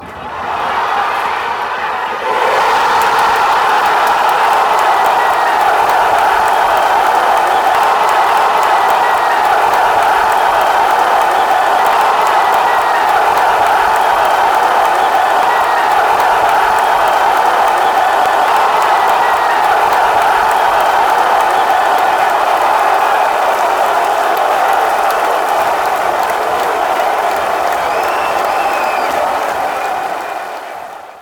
goal, fanchants

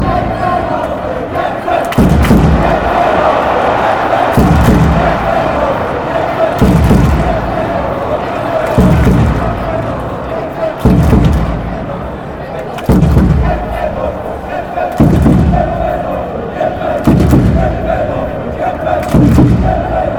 fight, fanchants

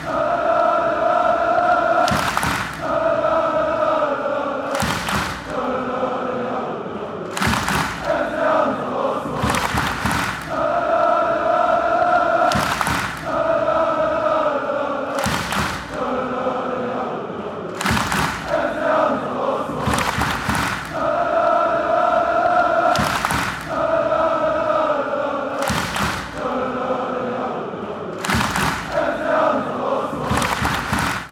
schalala fan chants

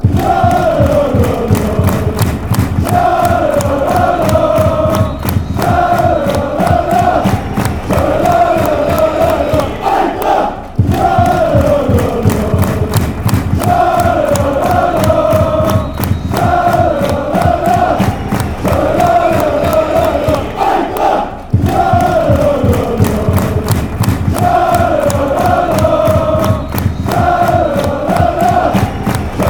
schalalalala fan chants

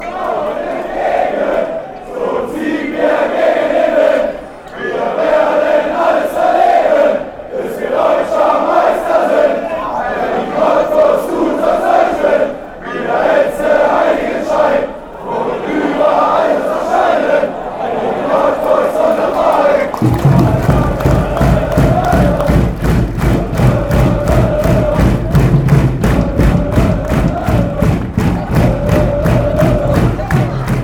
champion, fanchants

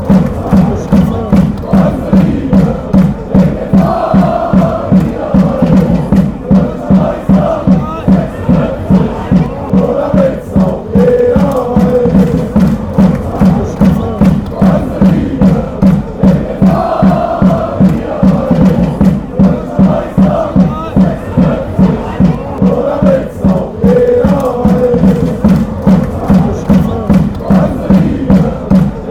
German champions, fanchants

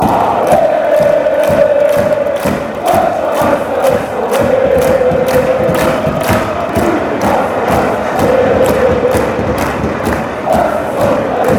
german champion, fanchants

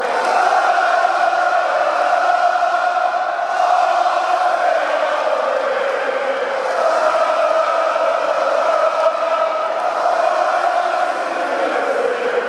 stadium, fanchants; ernst happel stadium

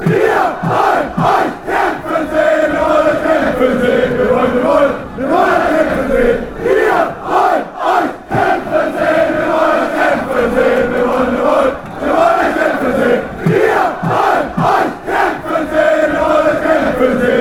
see you win fan chants

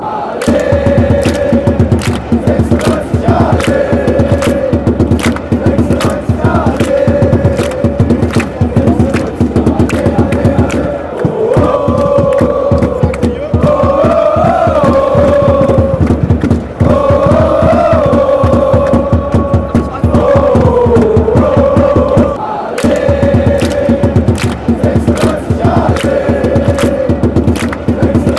ohhh, fanchants

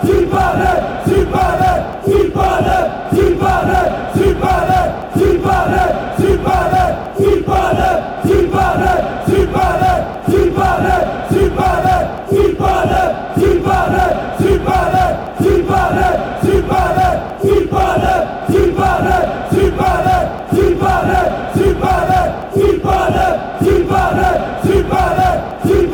fan chants; south baden

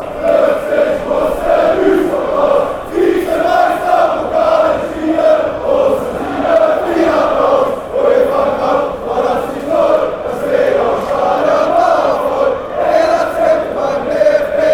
fan chants; zebras

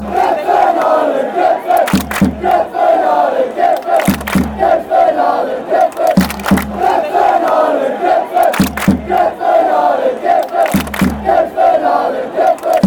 fight, fanchants

